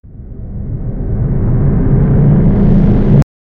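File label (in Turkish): uzay gemisi hızlan sesi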